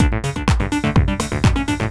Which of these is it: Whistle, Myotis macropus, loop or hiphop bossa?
loop